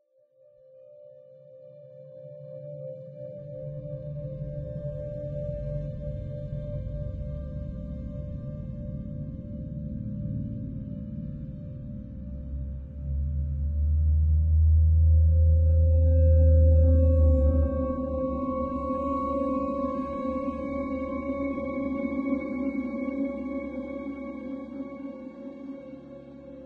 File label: space, Ambience